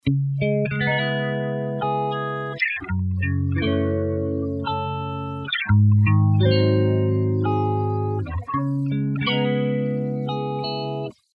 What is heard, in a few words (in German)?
Melodyne 5 Gitarre Harmonics Emphasis